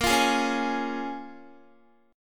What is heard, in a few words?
Bb Minor 7th Bb, Guitar; Bbm7 strummed